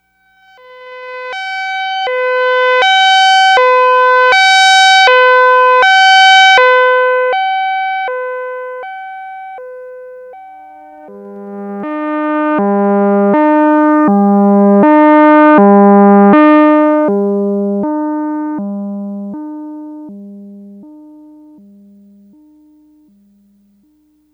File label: Dopplar FX, Yamaha DX100; Factory Patches